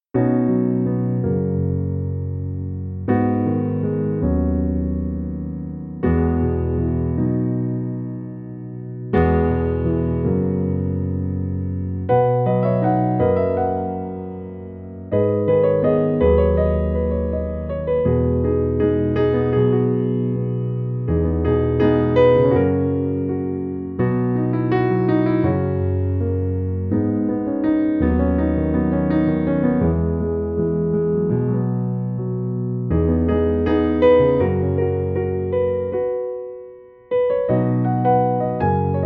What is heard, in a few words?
Piano Version